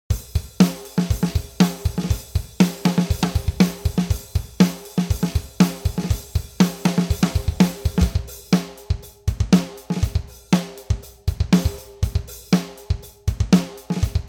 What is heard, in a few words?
voyagerdrums